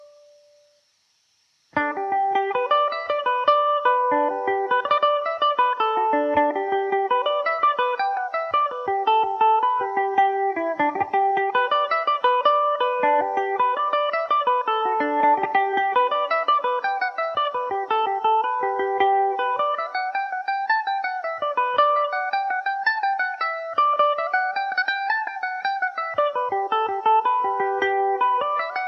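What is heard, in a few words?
G major, Irish mandolin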